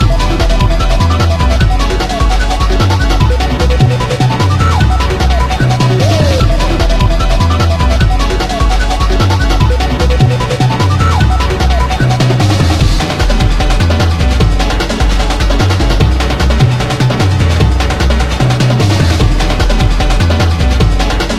rave; ionfury

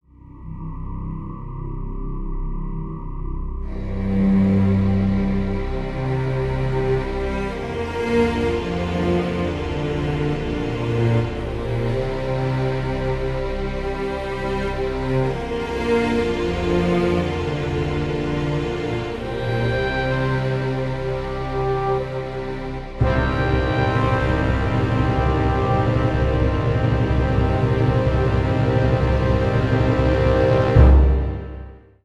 Ending; skeldal2

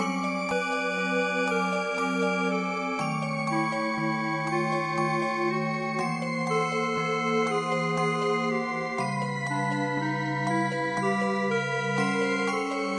menu

